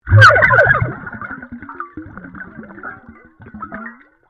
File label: Underwater Airhorn Burst, compressed air airhorn recorded underwater